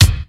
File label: airy kick single shot f sharp key; kick drum samples